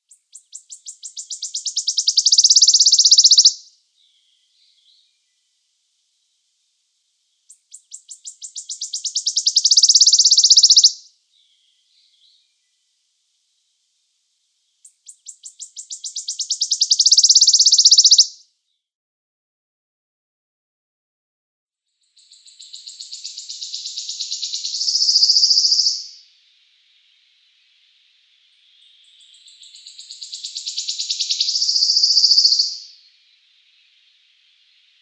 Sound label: Wood Warbler song